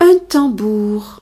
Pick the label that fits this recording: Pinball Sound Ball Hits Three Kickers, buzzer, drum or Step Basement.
drum